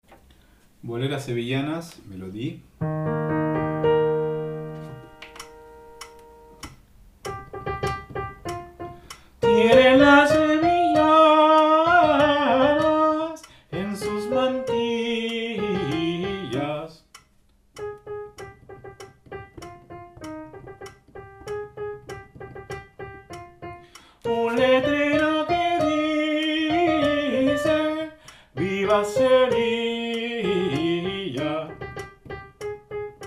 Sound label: Boleras sevillanas Soprano